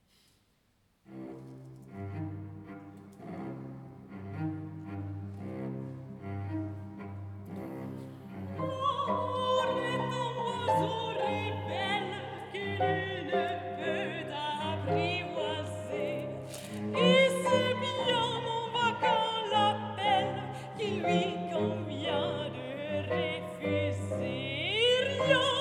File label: Carmen L'amour est un oiseau rebelle, Mezzosopran; George Bizet; Klavier